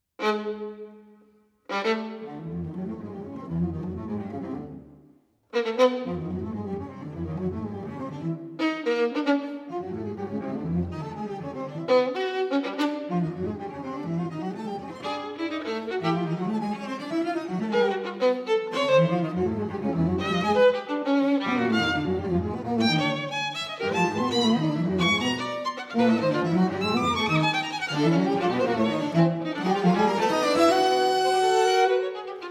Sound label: string quartet allegro possibile